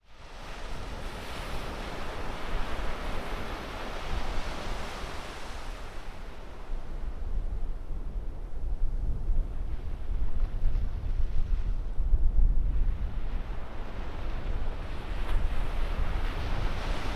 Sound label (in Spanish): MAR Y VIENTO GRAVE DESDE ORILLA CABO BLANCO; agua; océano; lago; laguna